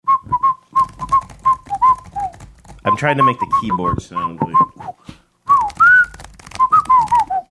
whistling strong bad email segment intro keyboard typing sounds